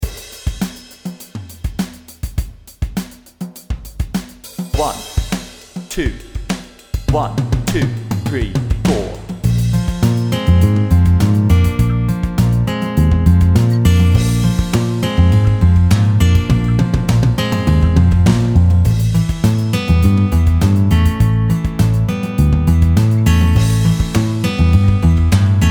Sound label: Speed Slurs Bb @102